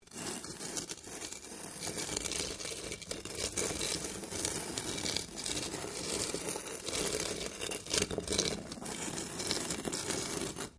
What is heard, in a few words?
Stone On Stone Small Scrape; Material components for constructing more complex sounds; Scrapes